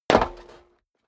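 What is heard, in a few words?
step boots metal dry jump, footsteps; schritte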